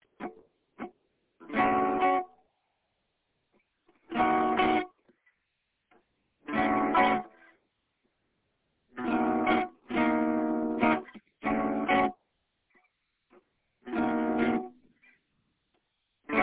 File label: lost at sea chords